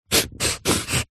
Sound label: office pencil artists thick draw shape paper